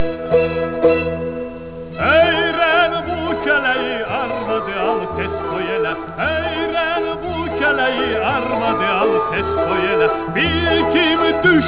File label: Trio